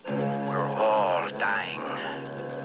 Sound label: DYING